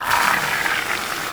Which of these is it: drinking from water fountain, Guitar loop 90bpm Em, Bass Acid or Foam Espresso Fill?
Foam Espresso Fill